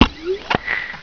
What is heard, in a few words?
Message Sent; Myst2